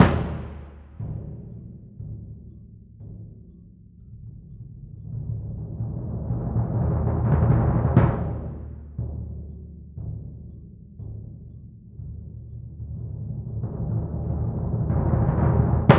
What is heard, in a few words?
Bass Drum, Orchestral Series Rock and Pop; Sonic Foundry (Sony Creative Software); Orchestral Series VOL-4 - Rock and Pop